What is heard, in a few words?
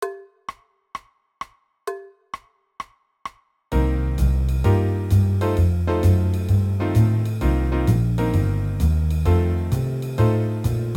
warm ups bb major